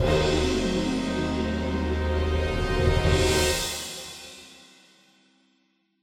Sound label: Fail; Fight Guards